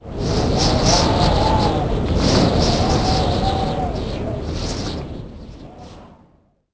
wind; The Elder Scrolls III Morrowind GOTY; XBOX

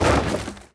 Wood Cllps Sml; objects; Star Wars Obi-Wan; XBOX

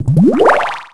Follow Ring; Finding Nemo; XBOX

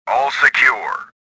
victory; swamp

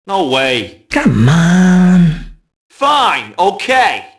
mobster group noise; Max Payne

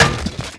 sink rip from wall

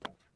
fs wood, footsteps

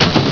bush; Chase Hollywood Stunt Driver; XBOX

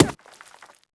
gravel hit; projectiles; bullethit; Max Payne

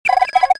gun on; movers objects; lady gun

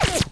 bullet whiz

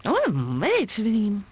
F happy; People

